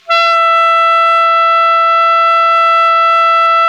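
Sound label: ALTO pianissimo F 4, Sax; Giga Samples Collection; ALTO SAX PP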